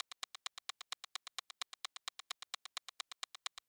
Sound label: Lo Fi Perc Loop